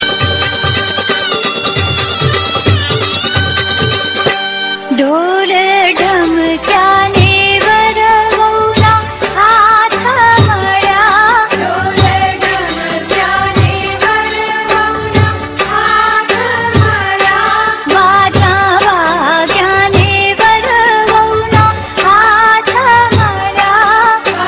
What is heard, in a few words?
dhol